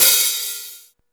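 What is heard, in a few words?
clean hat open, drum machine; DR.ACCESSOR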